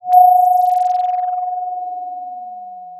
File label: Sound of a phone ringing